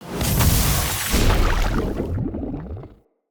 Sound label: UI Brewing Confirm